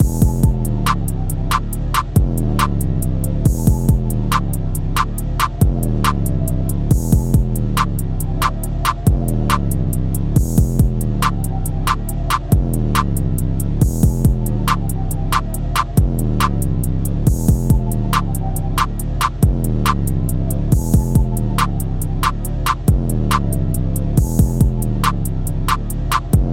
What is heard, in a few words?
helium trap loop